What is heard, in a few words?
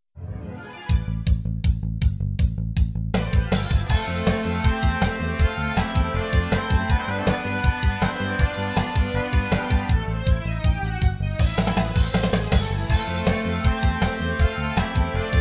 normal battle